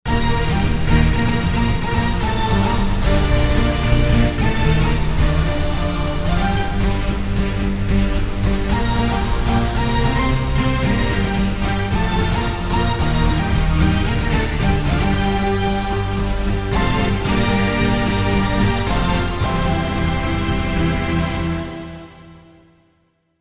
Dance Lead, Korg TR-Rack

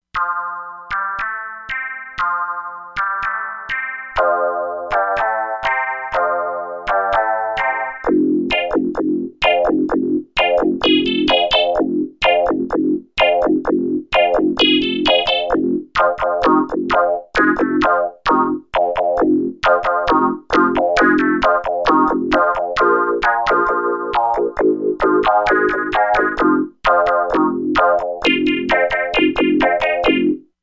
Radio Synth, Korg MSC-07S Synth

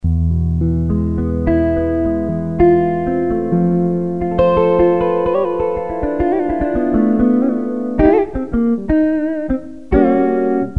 Guitar

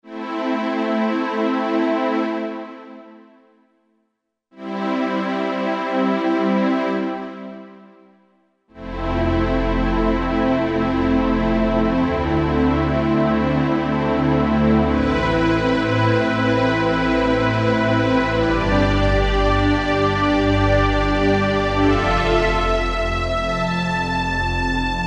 Analog Pad, Korg 05RW

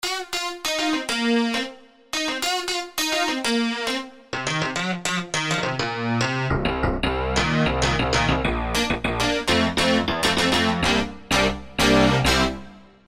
Snap Synth, Korg Wavestation A-D

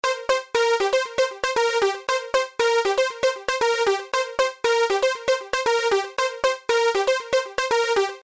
80s Dance Bass Synth; Apple GarageBand 2; Apple Loops

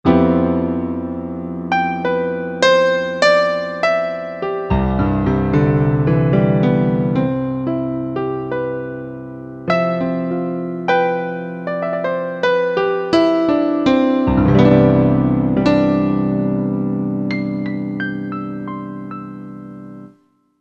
Piano, Korg MSC-8S Percussion

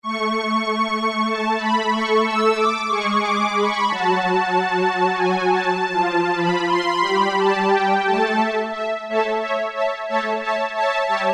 High Strings, Roland Juno-106; Factory Preset

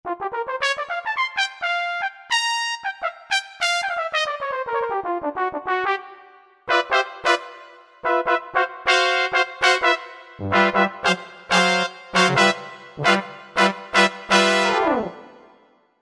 Mellow Stabs, Roland V-Synth; Factory Patches

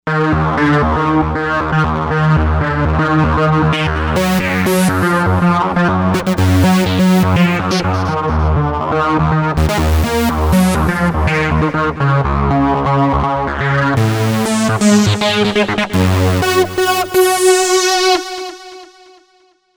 Feel The Pulse, Novation UltraNova; Factory Patches

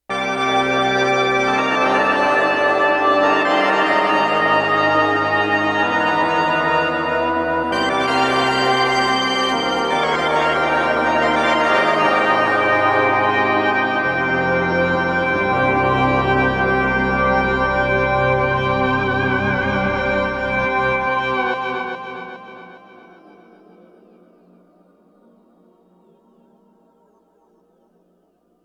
Sorcerers Organ, Roland VC-1

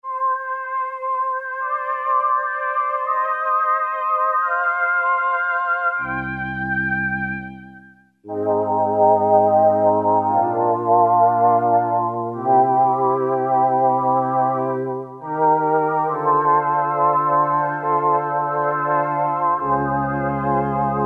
CHOIR, Kawai SX-240

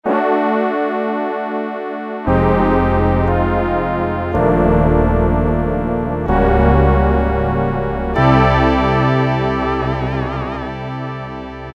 AnalogBras, Kawai K1m; Factory patches - I banks

